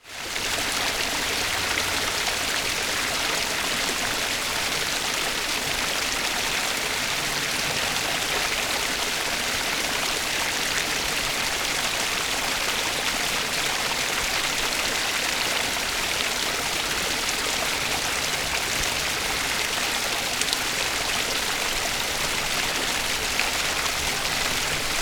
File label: Stream water flowing